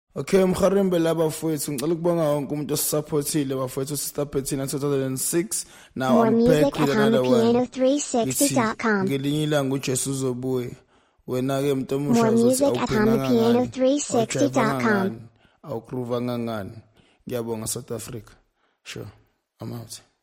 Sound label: Outro, kwaito